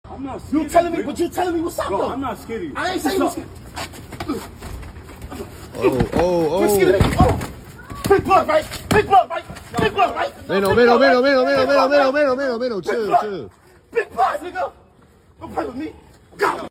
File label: Man is knocked out cold by a single punch